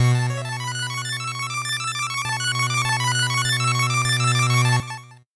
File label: 8bit jingle slow